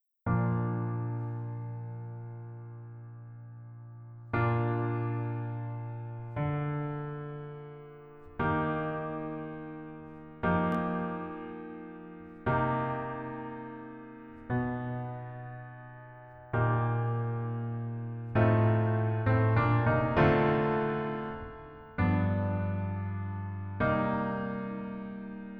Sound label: Piano ver